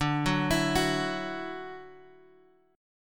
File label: D-Major Add 9th D down Guitar Open G